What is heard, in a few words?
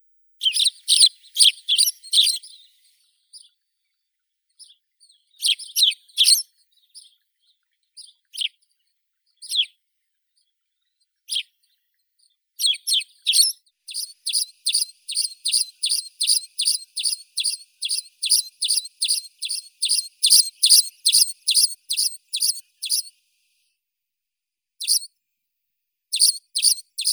vorobei chernogrudii passer hispaniolensis